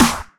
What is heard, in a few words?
Drums Snare Clap Edm